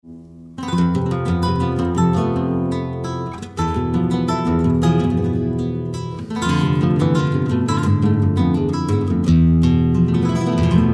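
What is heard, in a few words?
caracola rumba, flamenco guitar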